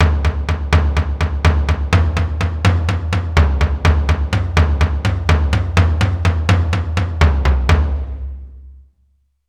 melodic tom bass

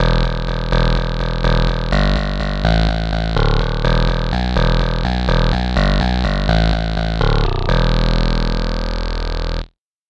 charang bass

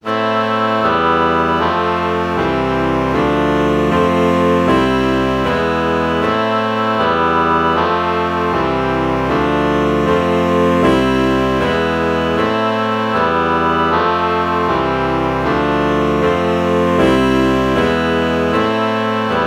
alto saxophone oboe chord morph

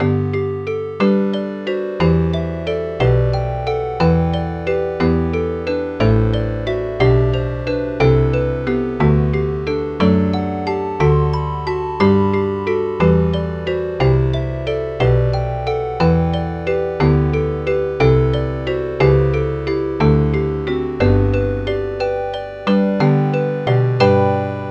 crystal

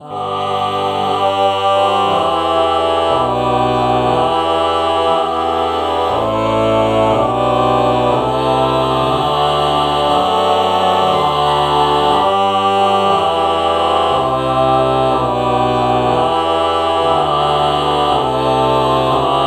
ah ah morph

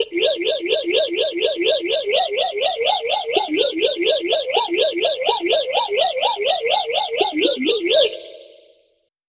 bird tweet bass

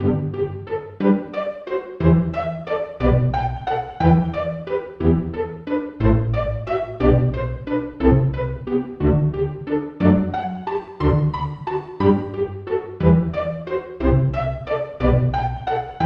pizzicato section

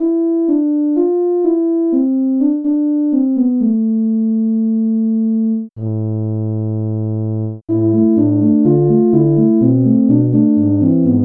tuba

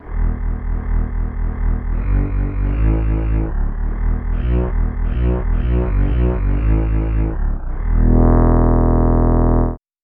bandoneon bass

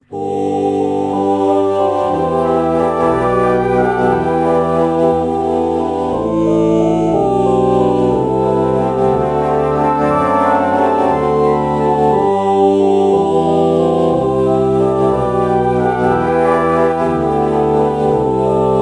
alto flute oh morph

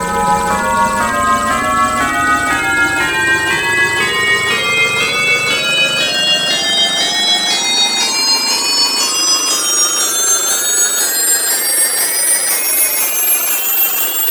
telephone; tonleiter

